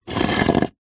foley heavy stone or concrete block drag on ground